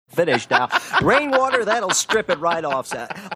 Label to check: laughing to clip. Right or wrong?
Right